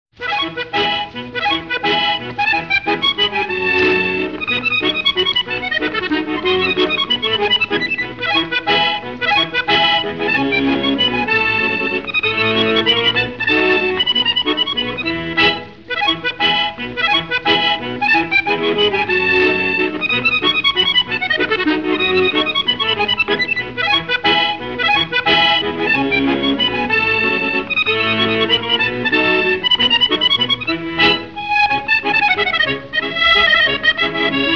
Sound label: Mazurka Fantaisie